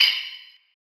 crash; 効果音：クラッシュ

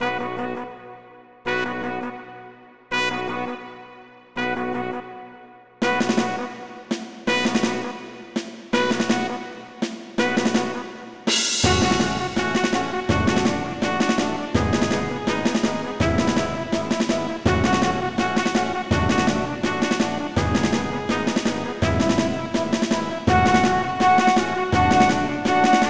battle strings

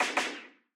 pistol

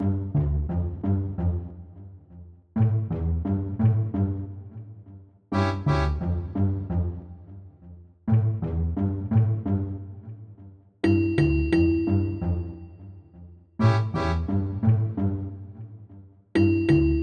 suspicion

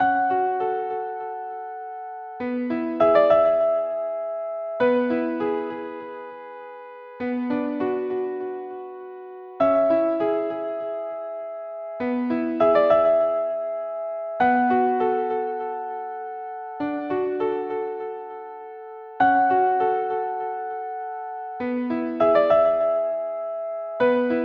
rains, piano

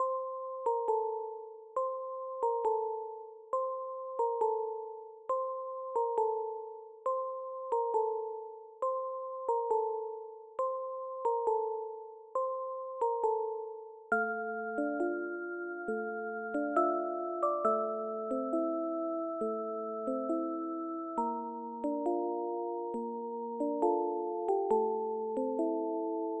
music box

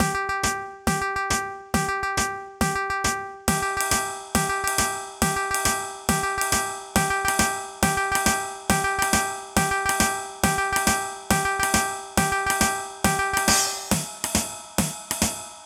comical

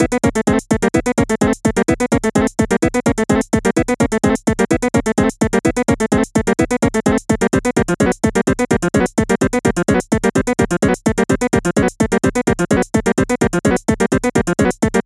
haywire

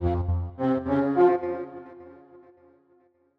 bad feeling

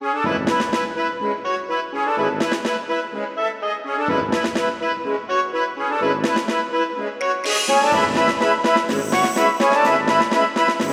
grand parade; remix

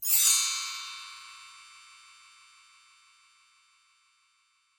twinkle

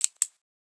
click a pen

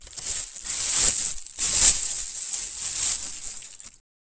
plastic bag

